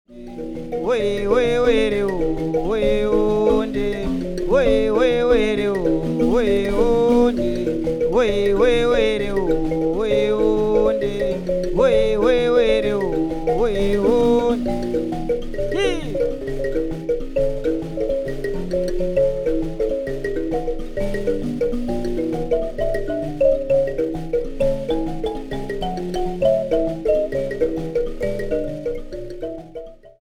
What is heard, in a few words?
mbira